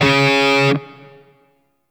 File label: Shawzin Oct Two C Sharp; Warframe; Grineer